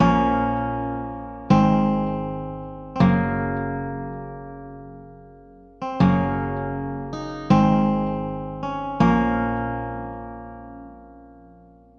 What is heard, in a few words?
guitar, Loops, Sad